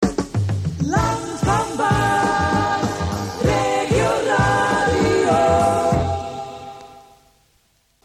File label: jingle; Land Van Waas Sint-Niklaas